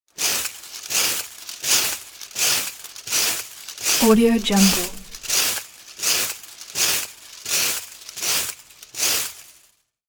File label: raking grass